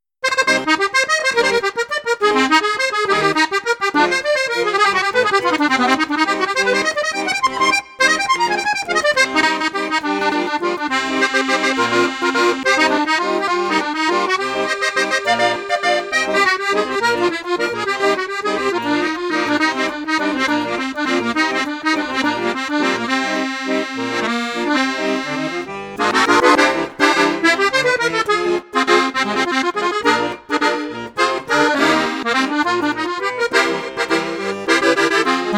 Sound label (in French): registre flute juste flute haute, accordeon; perle d'or